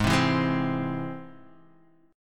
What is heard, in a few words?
G# Augmented, guitar